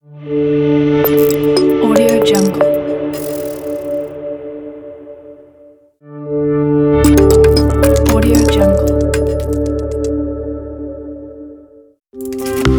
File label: elegant logo pack